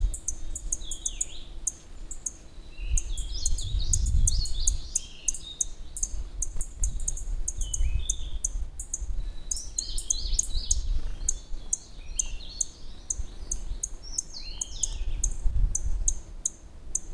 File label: bunting yellow throated Emberiza elegans